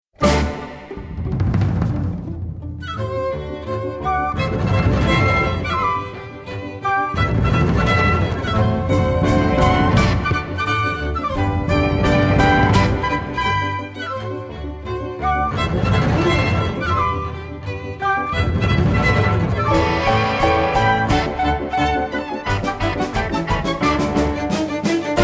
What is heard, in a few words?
Dance Mix Thunder and Lightning